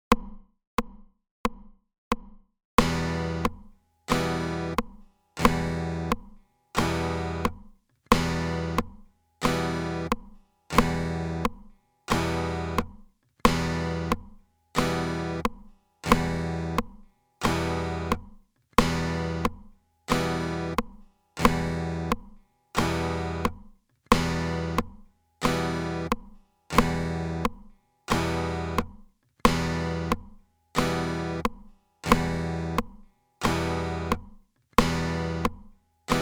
Couper le son

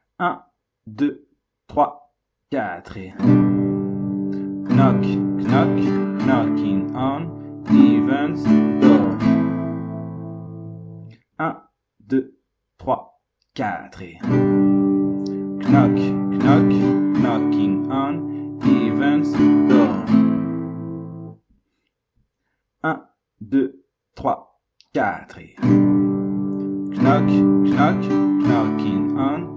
Refrain sans chanter Rapide

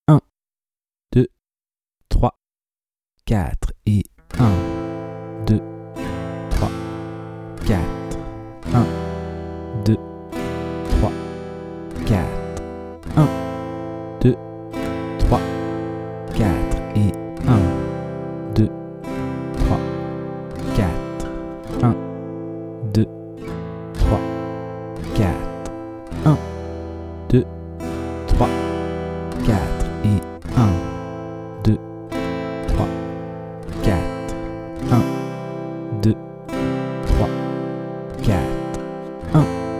lent travailler la rythmique; De 0 à vos premières suites d'accords

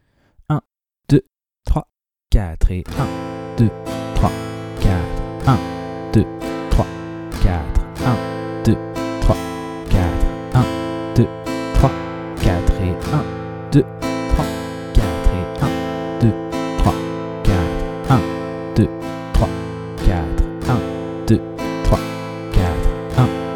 rapide travailler la rythmique; De 0 à vos premières suites d'accords

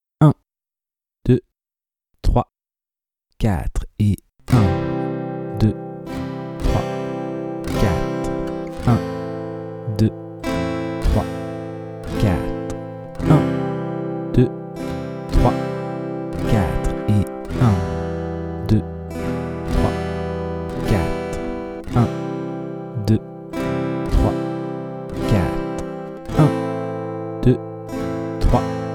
lent travailler la nouvelle grille avec la deuxième rythmique; De 0 à vos premières suites d'accords

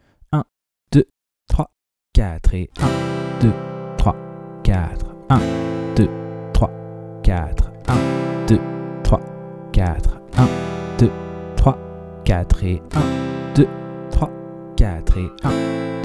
rapide travailler la nouvelle grille d'accords; De 0 à vos premières suites d'accords

